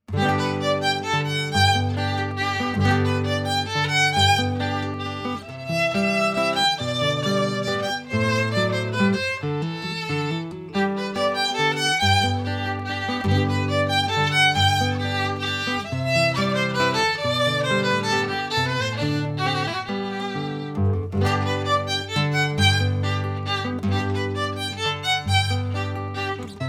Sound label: adagio minuet